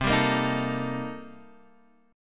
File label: C-Minor 13th-C-down, guitar; strummed; JGuitar